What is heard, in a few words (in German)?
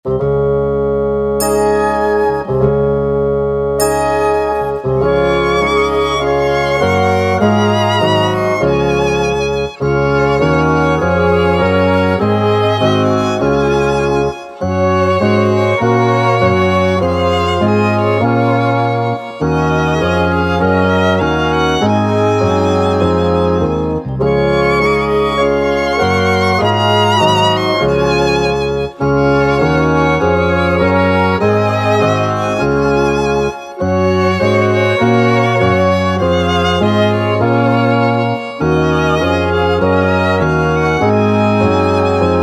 nur Instrumental; metronom theater; kindercasting; Metronom Theater Oberhausen